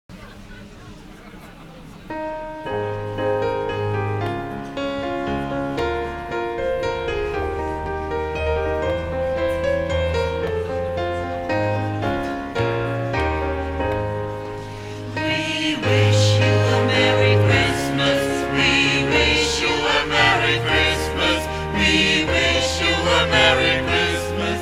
We wish you a happy Christmas, Gospelchor, Schlossweihnachtsmarkt draußen, Handyaufnahme